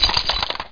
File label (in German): kiste; CD Action